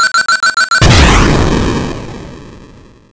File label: Bomb